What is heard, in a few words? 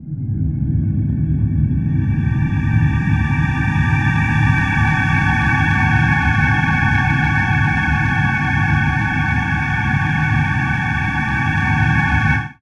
drone, Alien & Drones (atmo); ambient; Chillout